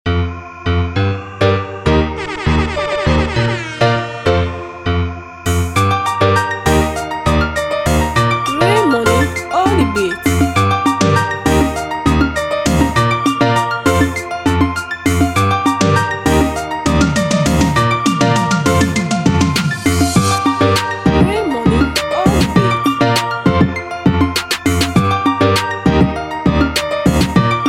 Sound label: Afro Reggae Dancehall type beat